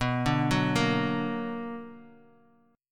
B Minor Major 7th B Guitar, chord; BmM7 arpeggiated; 6,5,6,3,x,x; 4F,4A,5C,5G,5C,6E; JGuitar

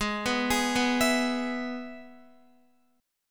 Ab Minor Sharp 5th Ab, Guitar; arpeggiated; x,11,11,13,11,12; 4F,4A,5C,5G,5C,6E

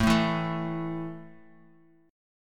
A 5th A down Bass Standard; strummed

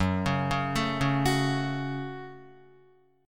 F sharp Minor F sharp, Guitar; arpeggiated; JGuitar

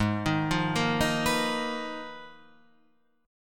G sharp Minor 6th Add 9th; x,x,6,8,5,5,5,8

